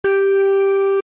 musique

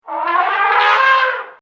elephant; MOBICLIC 64

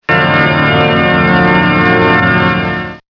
orgue; MOBICLIC 65

